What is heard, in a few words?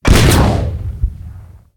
Pickup Explode